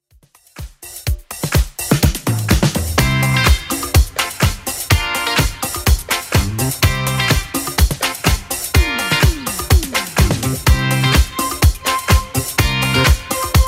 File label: 70s Club 125 bpm; Club Edit